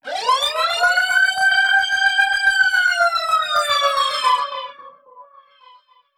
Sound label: Modu Proc, alarm siren, 110bpm; musicradar